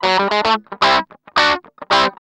GUI, LICKS; 110 LICKS

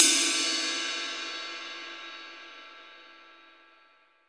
ride cymbal, mono; AKAI S6000 CD-ROM - Volume 3; MONO RIDE CYMBAL 1

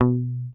HOFNER MUTE, Bass

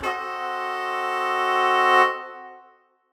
Horn Swell Adim; undercover samples

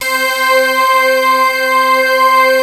JV1000 PAD; Rubber Chicken Software Sample Bank

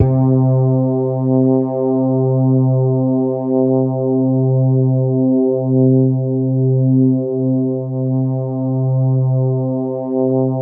C3, SynthLine

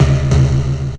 stabs; stabs & hits

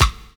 HORSE BEA; Univers Sons

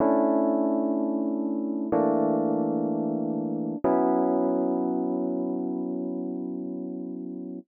125 Rhodes E, Keys, Nu Jazz House

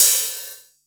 Rides, Cymbals

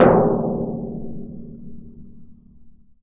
TMP C# HAN, World & Orchestral Percussion; ILIO; Synclavier Percussion 2

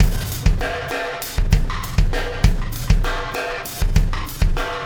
Wormhole Beat, Processed Drumkits

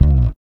REAL BASS, BASSLICKS; Ueberschall; Jam Box

